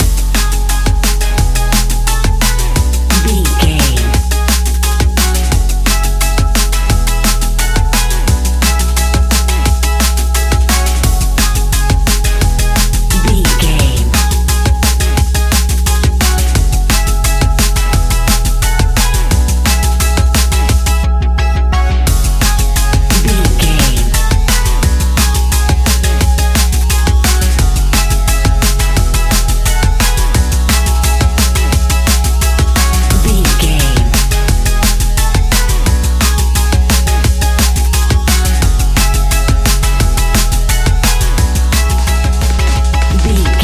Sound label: astral pulse, A cool piece of electronic music perfect for the nightclub